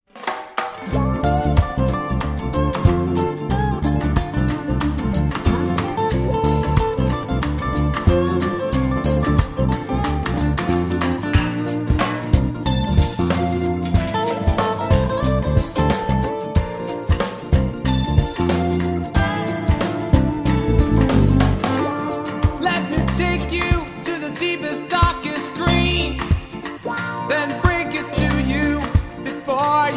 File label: Reggae Blues